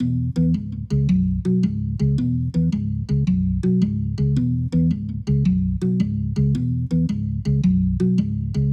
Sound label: Rotor Riff, Organ